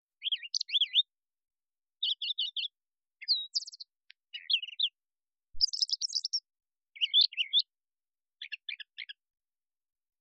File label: Brown Thrasher